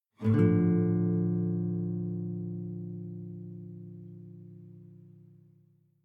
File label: Savannah Music